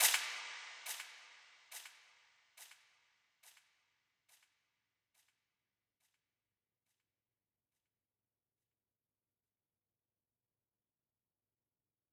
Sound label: 140 BPM D# Min FX; Cymatics; Destiny Gold Expansion Stems; Hall Of Fame